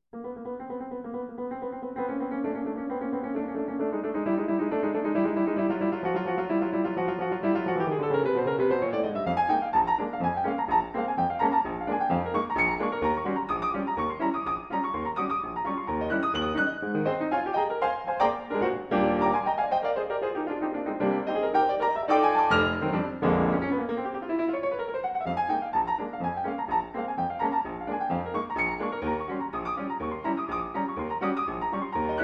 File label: piano transcriptions